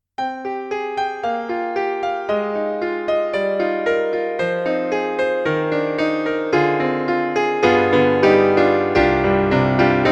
Kurzweil MicroPiano MP-1 Stage Piano, ensemble tuning (440-tuned)